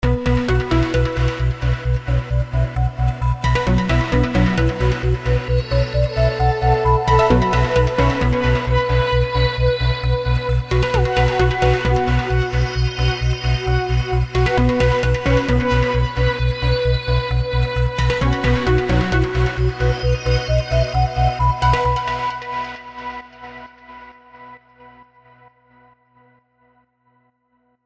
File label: FX Jungle Nights; Vengeance Sound Avenger 2; Generative 1